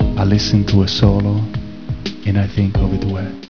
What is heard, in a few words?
duet